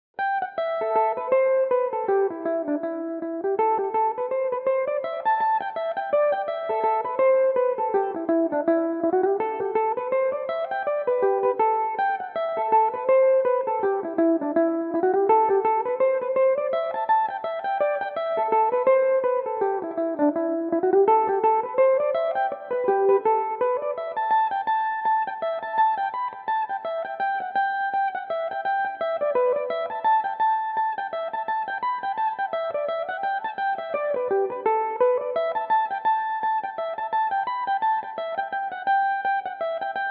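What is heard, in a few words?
A dorian, irish mandolin, tunes; saint ruths bush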